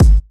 prominent kick drum sample g sharp key, bass drum single shot tuned to the G# note